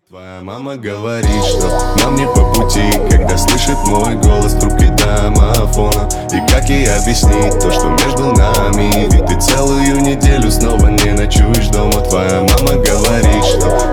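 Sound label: popular choruses for call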